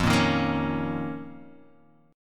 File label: F 7th Suspended 4th Sharp 5th; F7sus4#5; strummed; JGuitar